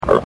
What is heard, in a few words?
Pig